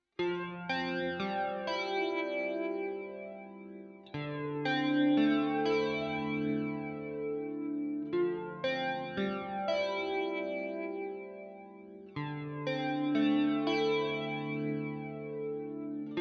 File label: Flanger, modulation pedals